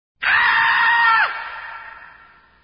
fall scream

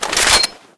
mag draw, weapons; deluge

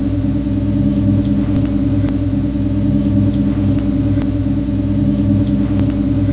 tardis hum; de tuscan cz

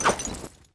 knife swing, weapons; svencoop; ww2projekt

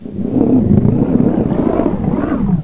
pit drone idle; svencoop; sectore

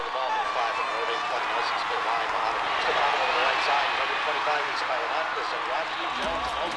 tv

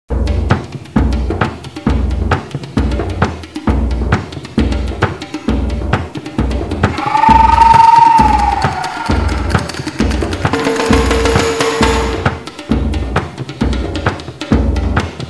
capoiera; beach

